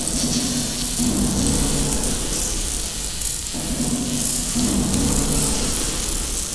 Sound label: cryo loop; Quake 4 Alpha; ambience cryo